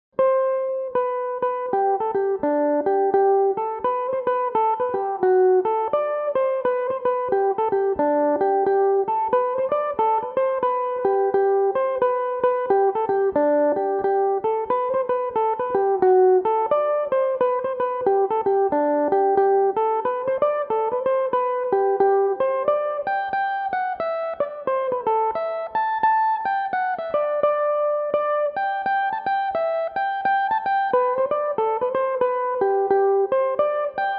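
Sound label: vargus jig G major, irish mandolin; Jig (6/8); Belmuse EM-190 electric mandolin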